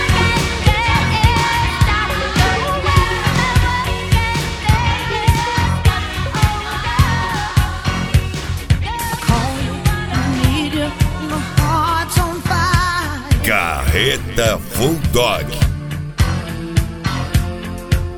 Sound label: flashback